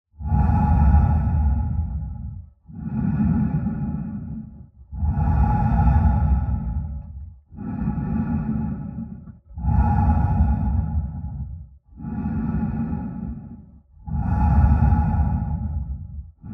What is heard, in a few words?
Space Divers Mini Sound FX; غواصان فضایی